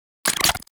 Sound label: Briefcase Launcher Rearm, Weapons; Warframe; Corpus